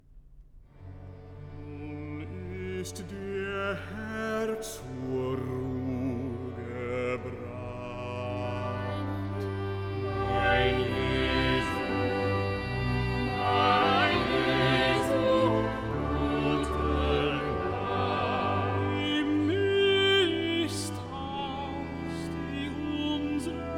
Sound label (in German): Recitativo Nun ist der Herr zur Ruh gebracht Chorus Mein Jesu gute Nacht; Bach Matthew Passion